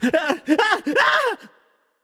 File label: nv fear; smite; voicepack; ganesha